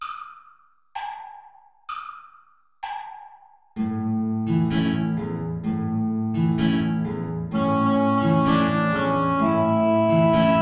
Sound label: tango play along without clarinet; albeniz isaac